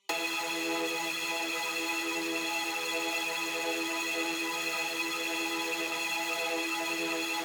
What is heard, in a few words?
Rargh Organ E